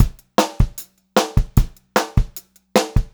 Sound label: Pop-Rock Drumloops, 152 POP